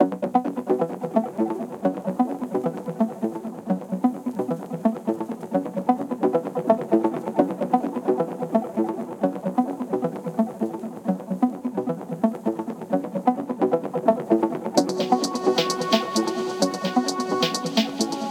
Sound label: Music 130bpm; Sockventure